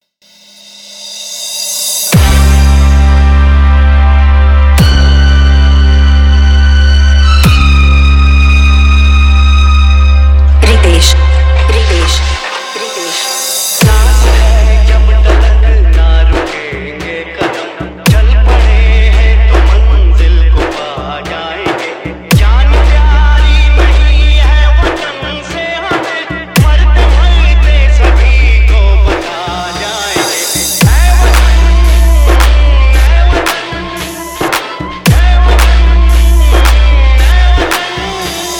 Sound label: Remix DeshBhakti Dj Song